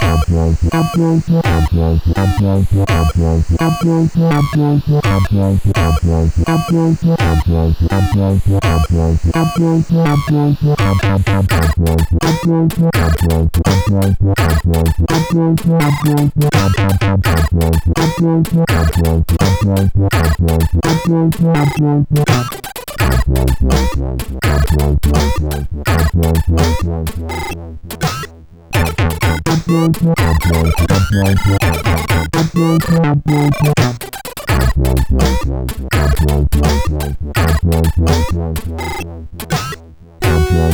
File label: CSG8580